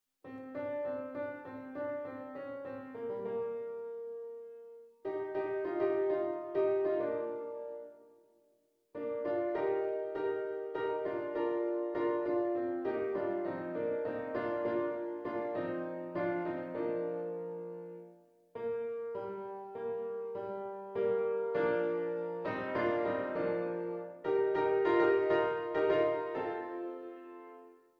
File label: Cups Backing